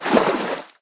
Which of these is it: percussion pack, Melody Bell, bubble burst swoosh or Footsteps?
Footsteps